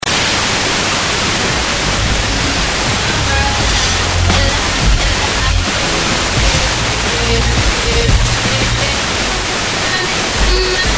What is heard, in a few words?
ping; perseids